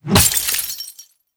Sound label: bottle hit; Lucius